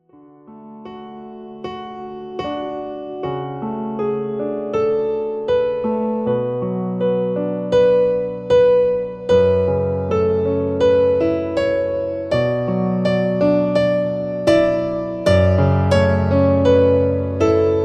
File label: Fantasia IV Piano